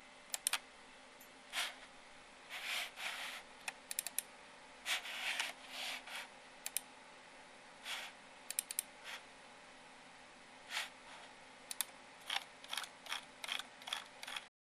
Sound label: Mouse Clicks Office